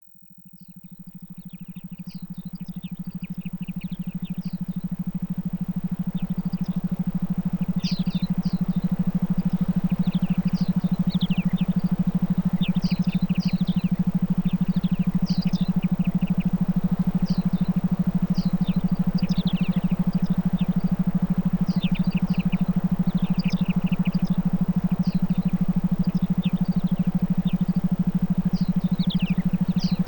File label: iso learning forest